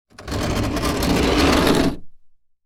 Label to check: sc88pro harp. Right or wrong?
Wrong